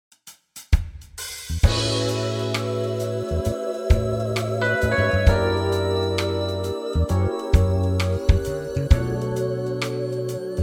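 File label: A to B smooth, Backing Tracks for Male Vocals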